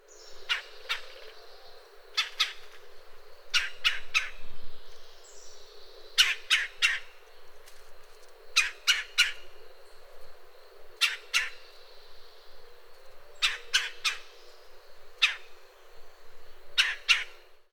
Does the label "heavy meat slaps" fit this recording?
No